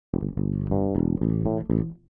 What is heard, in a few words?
DEEPHOUSE BASS 125 A